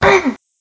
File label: grunt female